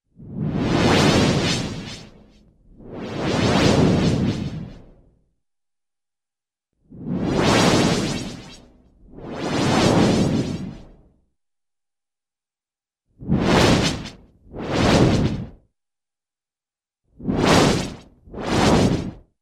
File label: Huge Slow Multi-toned Flanging Whoosh, Cartoon; Hollywood Edge; Cartoon Trax